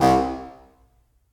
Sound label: Contra Bassoon Solo Staccato; Ableton; Orchestral Woodwinds